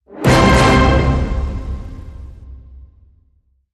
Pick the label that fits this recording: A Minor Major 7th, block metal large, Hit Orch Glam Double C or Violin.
Hit Orch Glam Double C